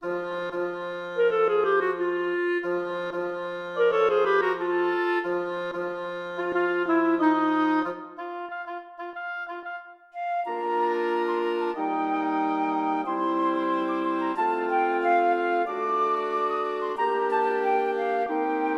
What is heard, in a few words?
jazzy we wish you a merry christmas quintet